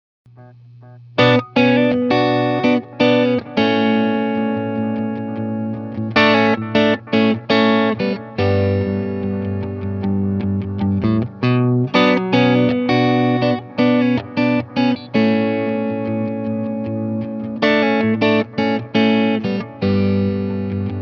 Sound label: 1958 clean neck, pickups